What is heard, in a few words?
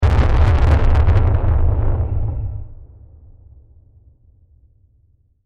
Heavy Distorted Explosion; Explosions; Crafted Signals